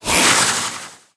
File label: unarmor; Warcraft 3